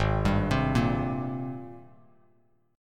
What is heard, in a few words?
G sharp Major 9th G sharp, Bass Standard; G#M9 arpeggiated; JGuitar